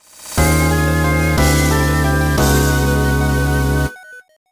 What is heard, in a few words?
Fanfare Decreased Ranking